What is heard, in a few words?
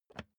office chair lever down